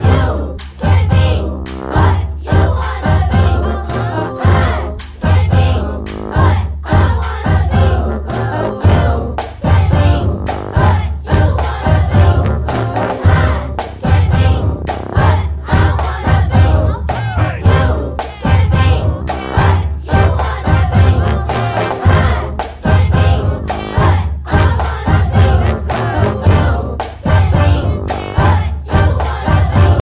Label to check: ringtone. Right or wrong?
Right